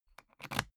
briefcase plastic latch lock key insert quick; industrie wirtschaft; bueroarbeit

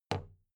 big plastic case handle bounce soft; objekte; kiste

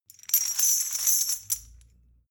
metal chain handle clean

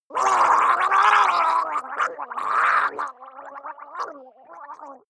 water monster female gargle angry; koerpergeraeusche; comedy-cartoon; soundpool

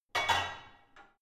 barbell only replace unsteady; sport freizeit; fitnessstudio

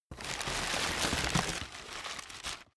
stuffing paper take out

